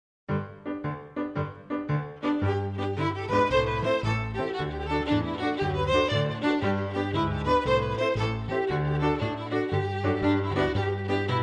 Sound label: New England Jigs